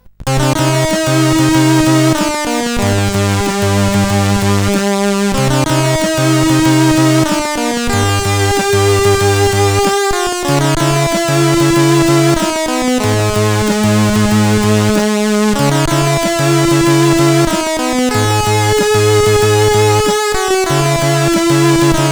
Sad End, CSG8580R5

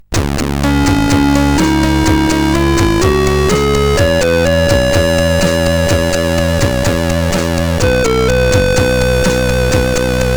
Panflute Magic; CSG8580R5; sid

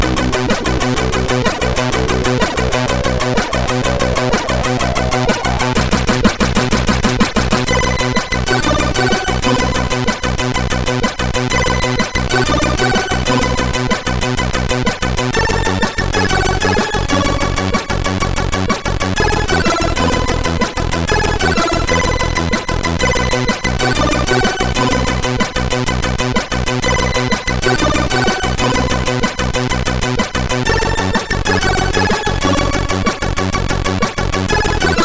Intro Tune, sid MOS6581R3

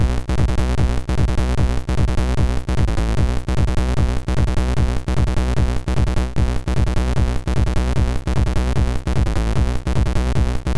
Loop, sid MOS6581

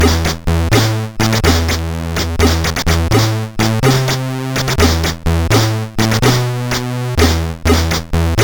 Stupid Test, sid CSG8580R5; Kleeder

